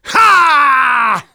Engineer gunslinger triple punch

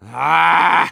Medic negative vocalization

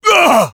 pain severe, fr; Douleur intense 2; Sniper

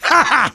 laugh short; Demoman

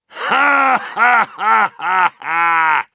laughter big; Громкий смех; Heavy; Насмешки пулемётчика

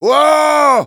Taunt flip fun

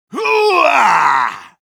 cheers, ru; Soldier; "Хуу-ааа!"

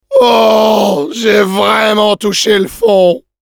demoman jeers, fr; "Ooooh, j’ai vraiment touché le fond."

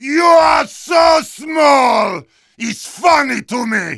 Heavy yell

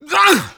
taunt exert

